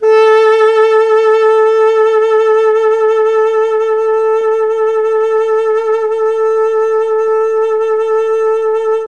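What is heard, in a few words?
A3 tenor sax, mellotron; CHMB TNR SAX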